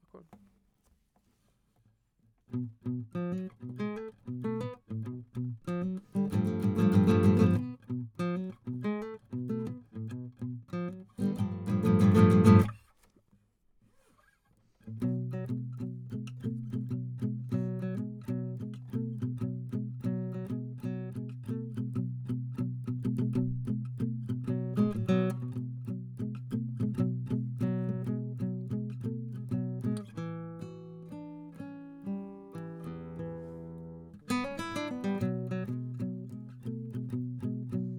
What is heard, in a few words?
GTR